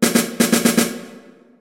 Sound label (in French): La rythm